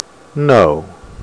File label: No beep; Sound Library 2000; Wayzata Technology